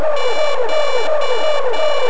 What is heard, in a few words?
Alarm Loop; PC Gamer IT CD 48; Big bang